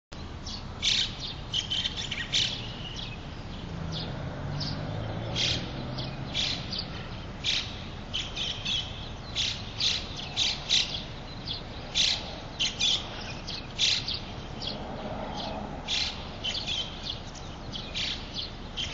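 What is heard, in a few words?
mukudori, grey starling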